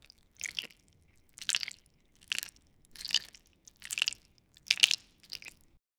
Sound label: squelch sound wet two obj